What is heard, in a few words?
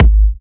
G#, kicks; markus hakala kicks